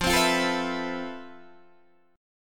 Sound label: F Major Sharp 11th, Guitar, Standard; FM#11 strummed